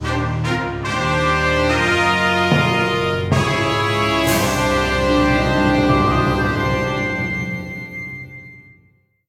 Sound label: Battle Victory!